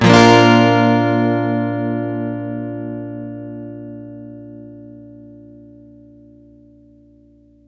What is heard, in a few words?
One Shot Guitar 125 BPM (C Major), Acoustic Guitar; Instruments; One Shots; GhostHack; Ghosthack - Free Guitar And Piano Samples